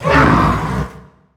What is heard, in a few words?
Ape Monster Hurt Roar; Mars Sortie